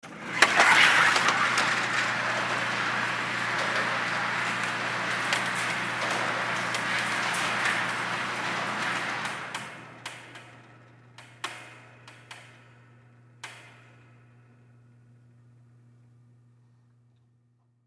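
Shooting Range Interior, Shooting Range Rail Slide Back 10 Ft; Shooting Range Rail Slide Down 100 Ft; Shooting Range Indoor Target Rail Slide Forward 100 Ft Check Target Cable Clang; Miscellaneous Sport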